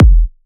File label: 128BPM Kick; Prophet Kick